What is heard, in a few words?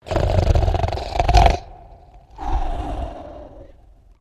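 Character Giant Sleeping Snore; the unfinished swan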